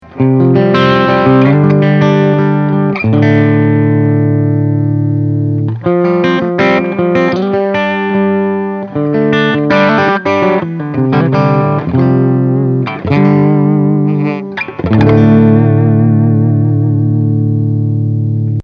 Gain 12 EL84

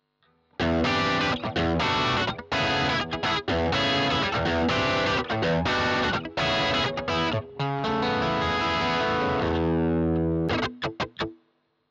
Modern OD

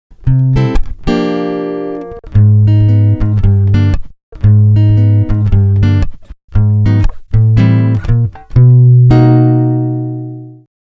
ovation elite

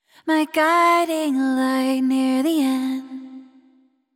Vocals Rev Vox Depth

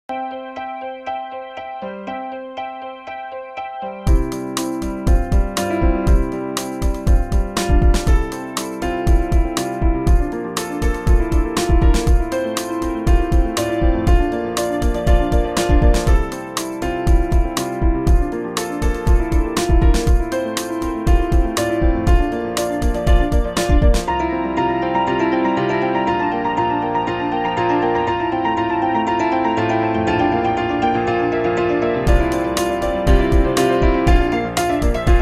JunoDS88 RnB Kit Layered

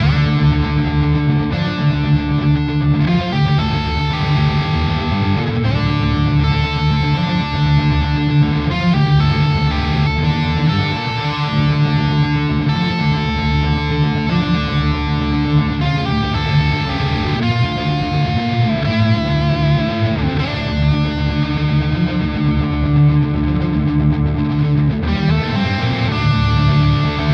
Schecter Banshee; Nebula Burst; Masterworks

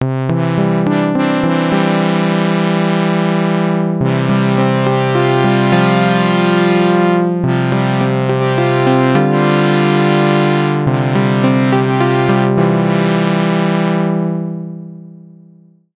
GForce Oberheim OB X Saw Pad

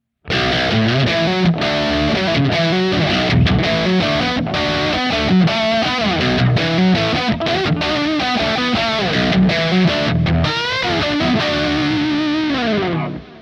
Bridge Humbucker Highgain Lead; Reverend Descent W

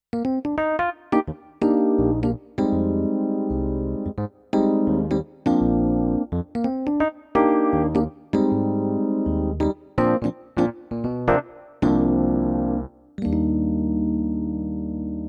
CVP609 EPiano

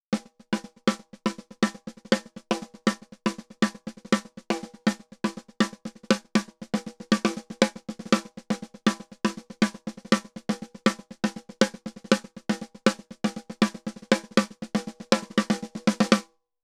SSL 611 E DYN SD Expander

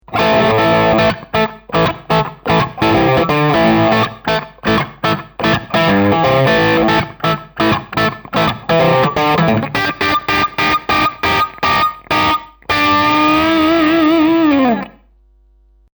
Bridge Crunch

